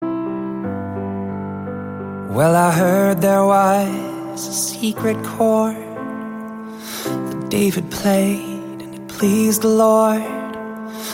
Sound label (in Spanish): voz y piano